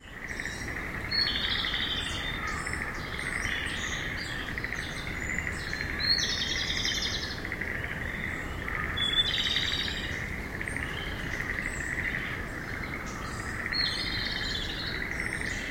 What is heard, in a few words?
Japanese Robin